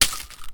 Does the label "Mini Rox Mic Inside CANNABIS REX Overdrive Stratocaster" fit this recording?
No